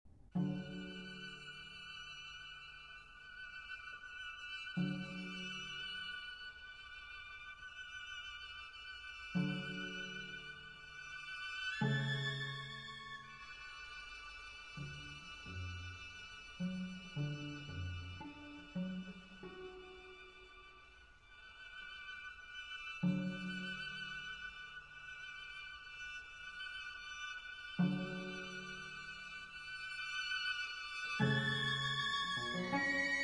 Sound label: String Quartet in D Major, Andante Sostenuto